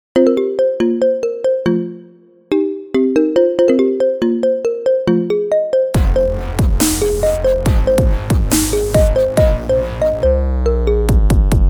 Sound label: Ringtone